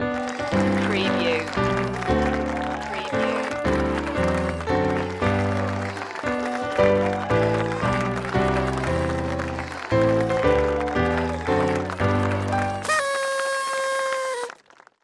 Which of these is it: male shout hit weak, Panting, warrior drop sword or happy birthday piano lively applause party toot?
happy birthday piano lively applause party toot